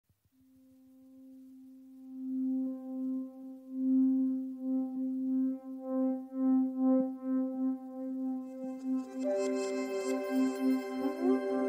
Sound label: curio music; Risk of Rain music pack; in-game music